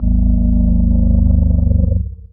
Elephant

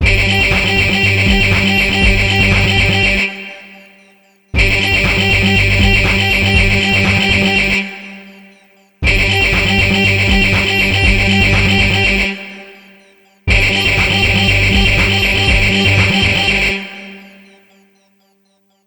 Heavy Metal Burst Intro

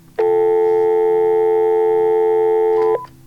Dial Tone

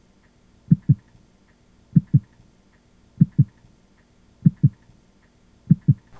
Heartbeat

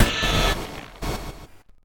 Pseudo industry; Tweaknology

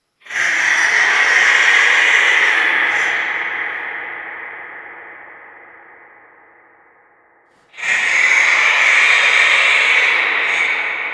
Demonic Shriek

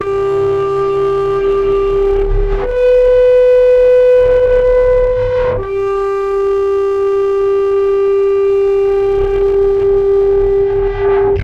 Bluesharp Loop Sound Radio Static

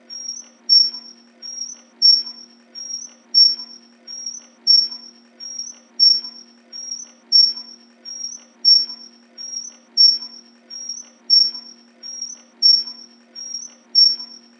Squeaky Wheel Loop

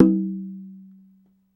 Ringing Frame Drum

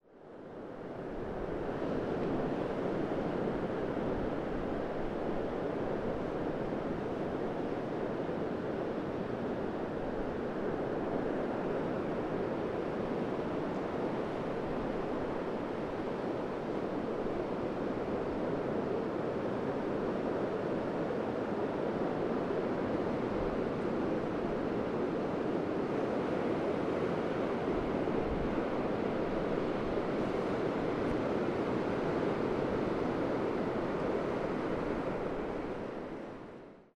Sea In Background Beach break

